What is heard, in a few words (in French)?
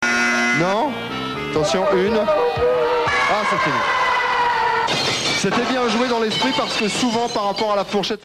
tyrolien chute; [TF1] Le Juste Prix; Lagaf'